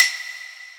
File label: soft hitwhistle; izduh v4red temu